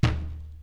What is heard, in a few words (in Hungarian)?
ajtó; 5hangú